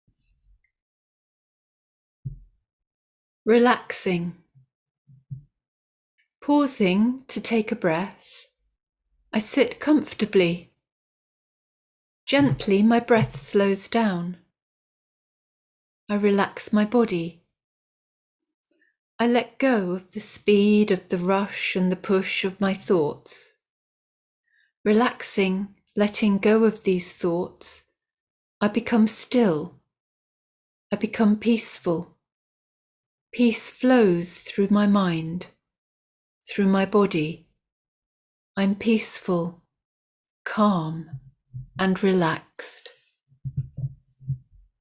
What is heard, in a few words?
Relaxing